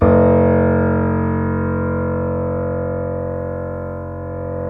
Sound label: C7 PP, The Ultimate Piano Collection; East West